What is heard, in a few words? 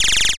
rewind